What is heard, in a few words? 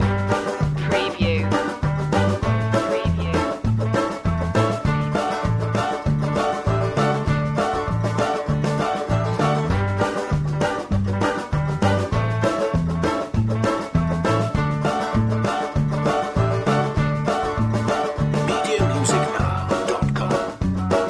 jig